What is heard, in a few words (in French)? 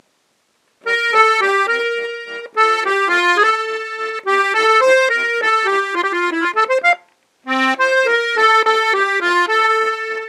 Valse